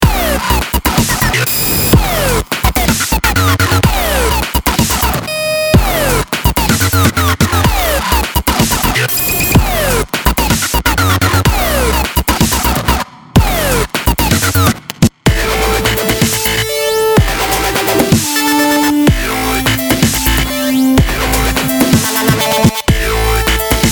Smashing Dubstep

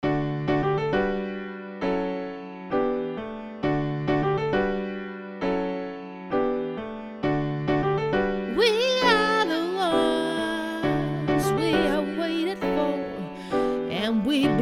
Female Vocals

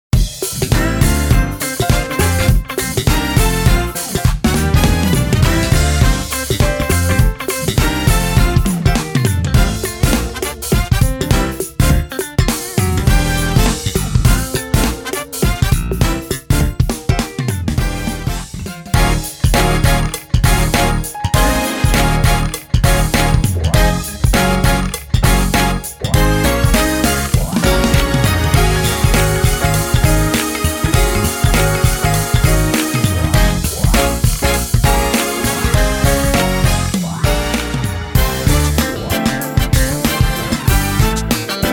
Church Grooves

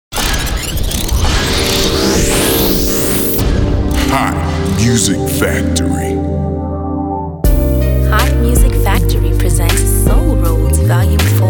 Soul Rhodes

